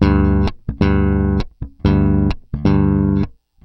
Groove Pluck, Bass; Bass X

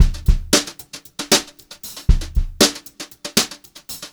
HOTROD BEAT, Brushed Drum Loops; USB Soundscan vol.39; AKAI

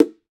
bongo; World Combo

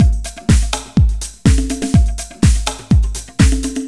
LOOP 124

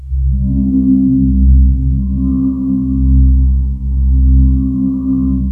wake up pad; WAKE UP LYR; Masterbits - Tekkno Trax; SYNTHFX+PADS

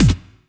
bass drum, 170 BPM, drum & bass; Ueberschall; Drum & Bass Flash Trackz

